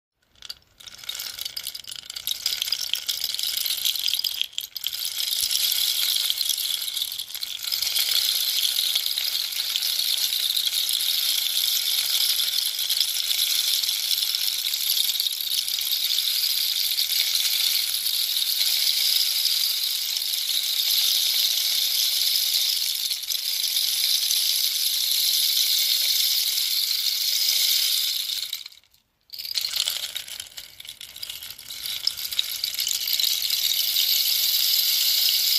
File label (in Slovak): bambus, dazdova bambusova palica 60 cm